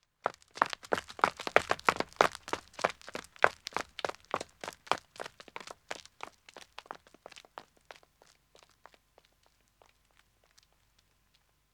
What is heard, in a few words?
Country Road Running 1 man &; Footsteps; The BBC Sound Effects Library